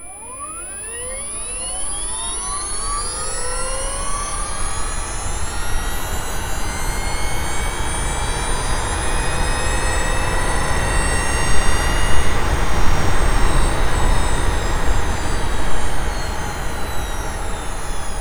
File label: nissan leaf normal mode max torque engine compartment; eomys